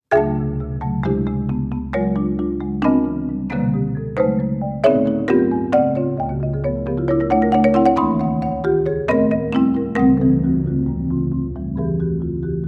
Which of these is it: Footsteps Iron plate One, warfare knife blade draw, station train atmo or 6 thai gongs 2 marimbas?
6 thai gongs 2 marimbas